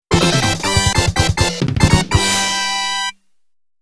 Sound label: Competition Clear; Taito Digital Sound Archives Arcade Vol.3